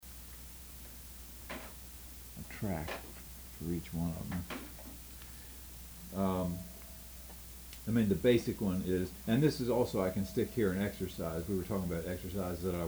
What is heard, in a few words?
8 string